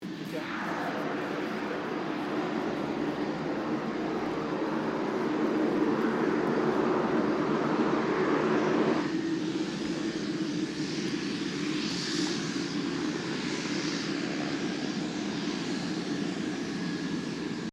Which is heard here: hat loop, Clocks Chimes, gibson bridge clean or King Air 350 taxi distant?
King Air 350 taxi distant